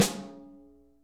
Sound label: SN ambient; Best Service; Real Mega Drums VOL-1